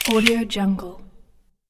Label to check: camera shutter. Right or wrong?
Right